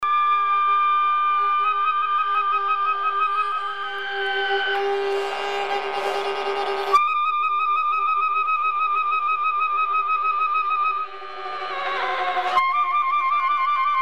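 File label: clarinet osc; Spanish street recordings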